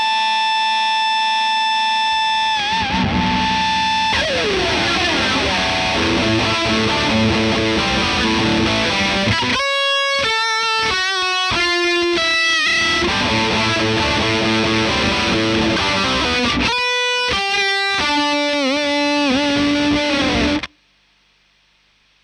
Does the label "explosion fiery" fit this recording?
No